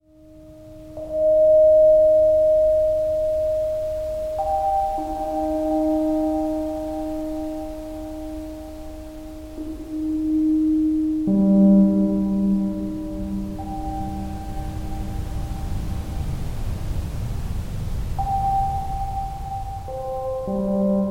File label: Ocean Generator